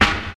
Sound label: dry steel snare drum sample f key, acoustic snare sample tuned to the F note; snare drum samples